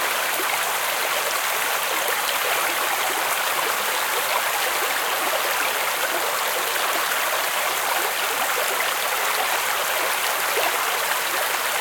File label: Ice Cliffs stream small